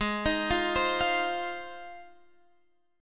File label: Ab 6th Ab, Guitar, Standard; arpeggiated; JGuitar